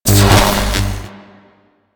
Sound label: Void Dragon Ring Sparks; Enemies; Warframe; Duviri